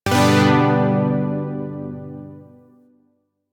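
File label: Shawzin Major Chord H; Zariman Shawzin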